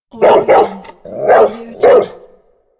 dog barking

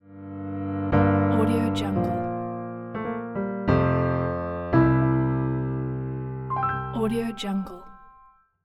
the piano logo

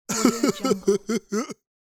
man nerdy laugh

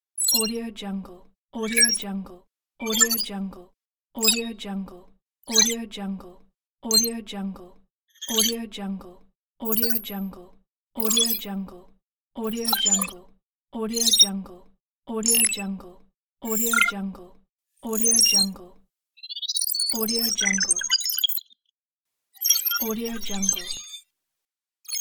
hud infographic pack; evdokim music

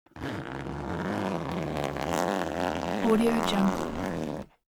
foley zipper down jacket open slow

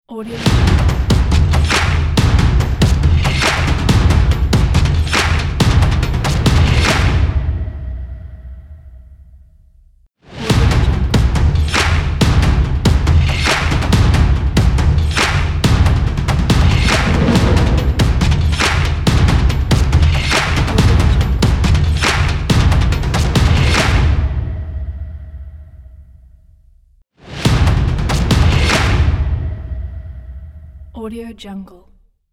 abstract drums logo

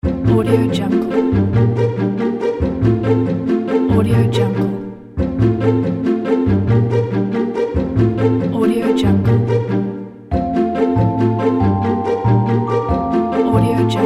adventure cartoon

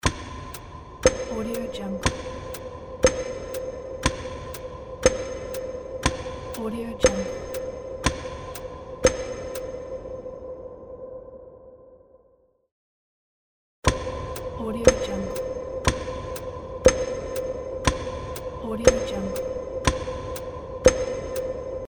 countdown

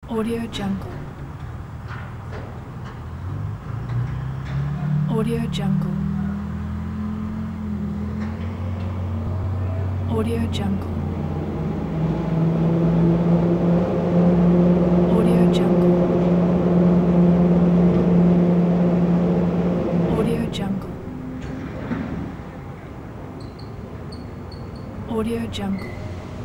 crane shipyard; starloader

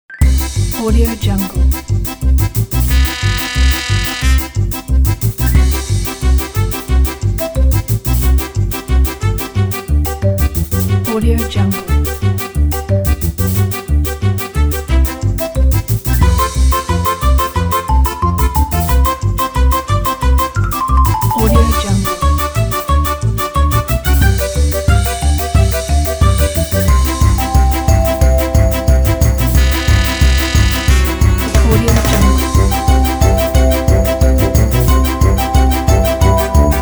cartoon chase

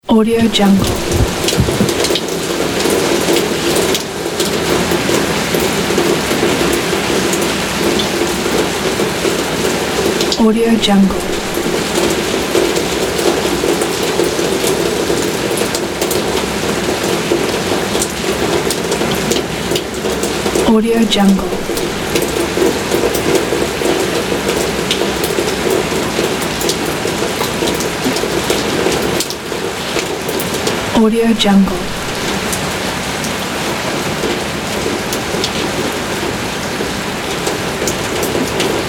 rain

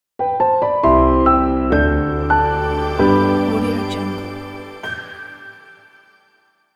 piano logo

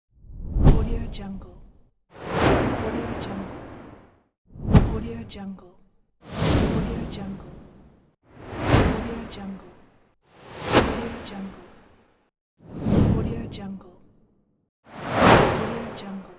whooshes

